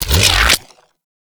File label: Shotgun Beam Retract; Weapons; Warframe; Tenno; Revenant Shotgun